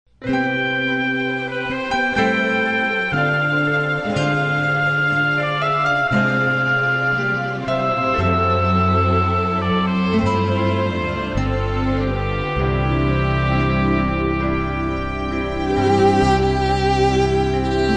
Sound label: Instrumental Praise